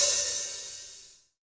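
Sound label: HH, hi-hat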